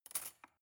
cutlery cake fork drawer place soft

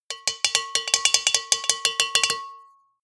bowl hits rattle fast long

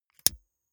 lighter electric switch flame on gas

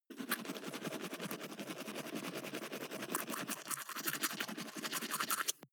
teeth brush dry closed front fast

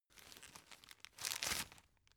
tissue take

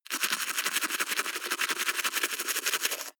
teeth brush wet open front fast

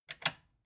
metal button click power off fast

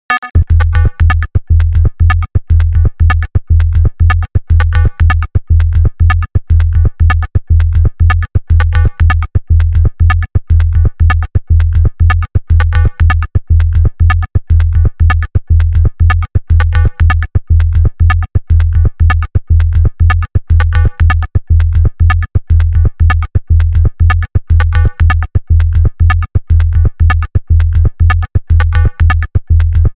level music

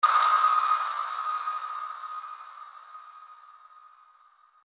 sonar ambience high

